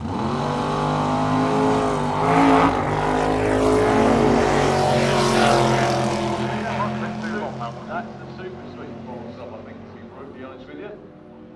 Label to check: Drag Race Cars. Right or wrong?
Right